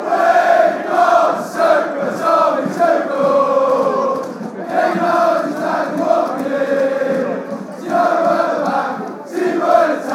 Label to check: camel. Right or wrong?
Wrong